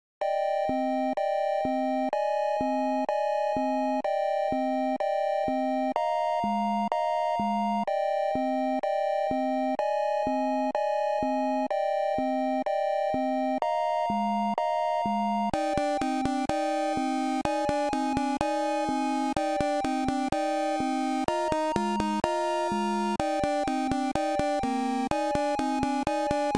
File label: slow melancholic theme C64 style long version with refrain